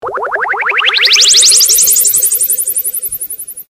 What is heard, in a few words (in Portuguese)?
transicao vinheta radio